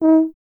BRS TUBA, Solo Instruments

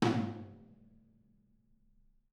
R B Rack Tom B Room; Toms; Sonic Foundry (Sony Creative Software) - Drums from the Big Room The Mixes; R&B One Shots

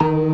CLASSIC C3, Synth and Organ

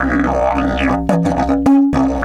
108, Didgeridoo

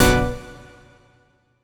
stab wide, stabs

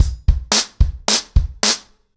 110BPM, ACOUSTIC DRUMS; The Roots Drumloops

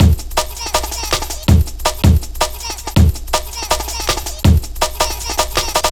A, Jungle Warfare Drum Loops

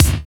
PHIL KICK; Drums In Your FACE!

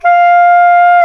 SAX SOP MP F, Soprano Sh

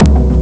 drum; ST-XX Amiga Soundtracker Sample Packs

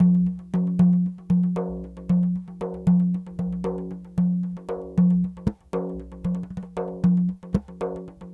Sm Frame, World Percussion

